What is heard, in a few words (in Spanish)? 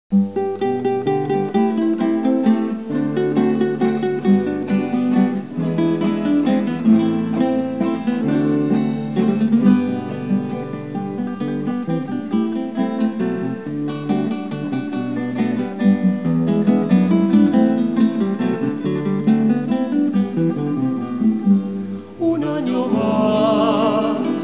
feliz aniversario